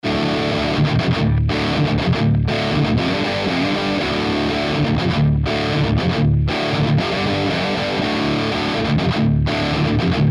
marshall jvm 410h high shelf

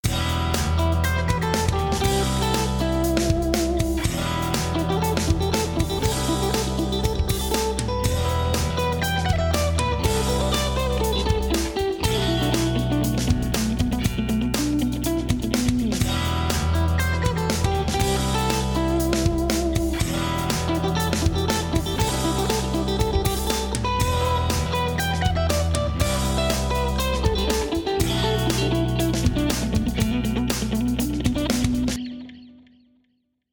Jam 2x Chorus; All Star Jam 3

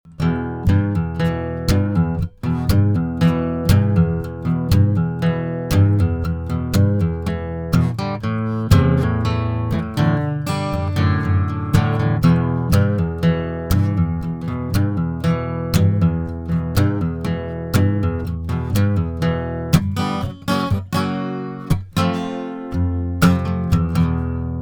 roundwound bluesy

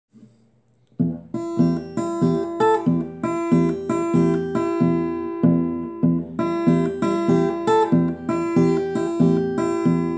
fingerstyle blues

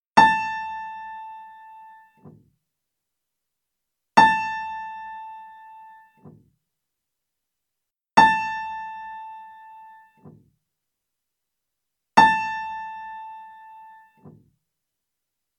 vox, Vox Continental

